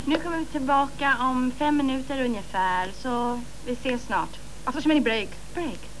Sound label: break